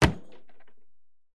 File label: household living room lounge furniture cabinet large drawer close shut